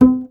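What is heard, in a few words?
string contrabass; Miroslav Vitous; Solo Instruments VOL-2; Solo lnst. II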